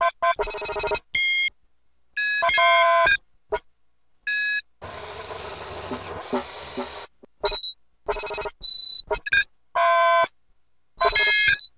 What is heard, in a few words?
Computer Beeps; Home & Office; 1001 Sound Effects